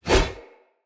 Whoosh, Swords; Weapons; Mockery; Mockery Brazard

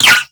ricochet; Cowgirl

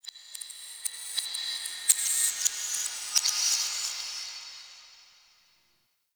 Metal Whispers; Warframe; The New War; Zariman

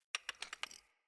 Foley Winch Release; Characters; Enemy; Nimrod

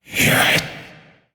Possessed Red Veil Vocal Attack

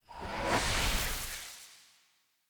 Soul Steal Combined

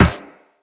Run Metal Perforated, footsteps; Operator

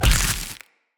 Claw Large Impact Flesh, Enemy Unarmed; Weapon Impacts

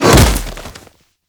Throw Impact; Warframe; Kuva Lich; Finishers

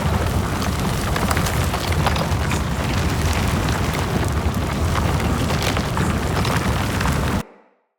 Destroy Fire Loop, Siege Engine; Weapons; Enemy; Artillery; Ode Siege Engine

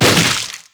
Infested Runner Arm Attack Hit A; Enemies; Abilities; Warframe; Proto Infested

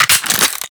Assault Rifle Reload; weapons; Warframe; Grineer

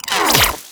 Split Laser Retract; Warframe; Corpus

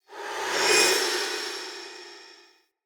Sword Throw Wind Up; Weapons; Player; Swords; Fenn Jotar Sword

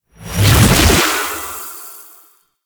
Equip Impact; Noctua; Warframe; Dante

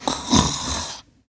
Snores, Grandmother, Vocalizations

